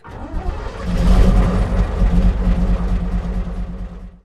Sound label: chieftain engine start, tanks; War Thunder